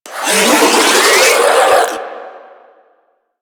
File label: Infested Ancient Melee Mid Spin Scream; Enemies; Warframe